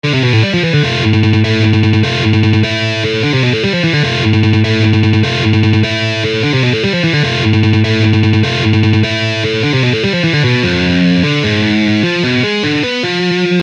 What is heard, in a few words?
Lick; Guitar Club Febbraio 2020